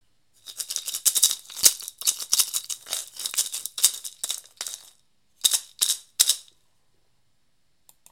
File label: Guinea hen shaker; Hand-Crafted Instruments; Hand-Crafted Instruments from Africa, South America & South Asia; rattles